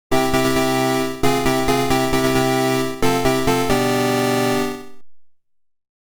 fanfaare; Marchen Veil

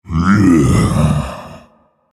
Vocal Efforts Light; Colossus

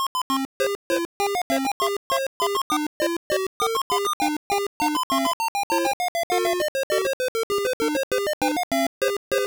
Sailor's Hornpipe; King's Quest III To Heir is Human; IBM PCjr; The Pirate Ship

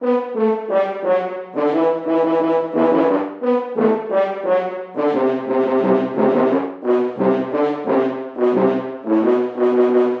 Horn; The Bard's Tale Remastered Trilogy

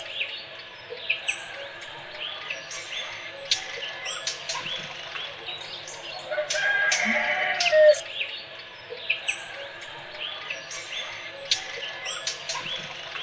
Slime House Ambience; Slime-san Blackbird's Kraken 2017 pc gamerip

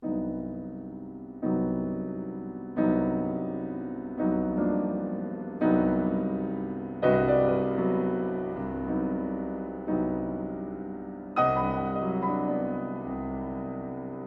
Piano Impromptu; Rule of Rose Original Sound Track 2016

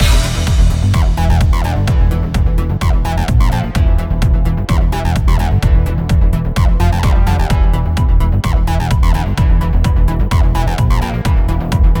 Music Arcade

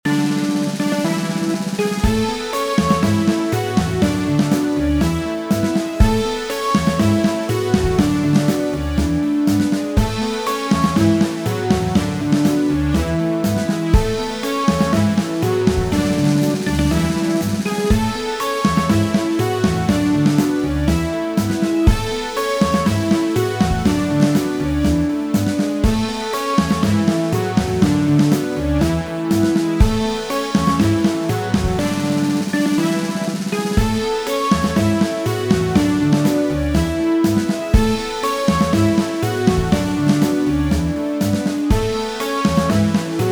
Victory!; Xenoverse Per Aspera Ad Astra; Team Dimension Grunt, Team Dimension Sergeants

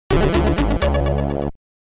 Time Out Energy Overdose, SID Stereo; Black It; Commodore 64

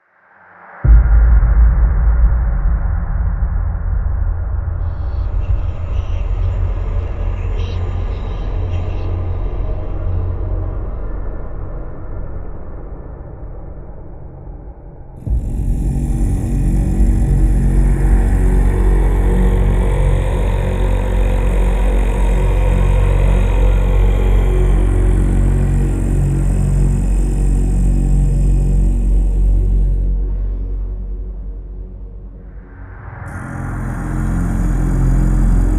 Main Ambient; Cultist Base

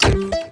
down test

